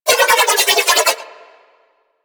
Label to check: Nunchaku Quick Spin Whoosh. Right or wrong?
Right